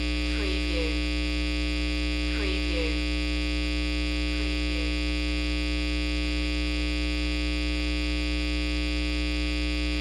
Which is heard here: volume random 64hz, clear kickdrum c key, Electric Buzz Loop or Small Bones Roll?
Electric Buzz Loop